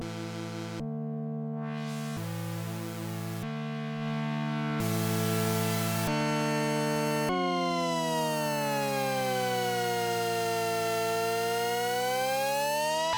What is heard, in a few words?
dissonant noise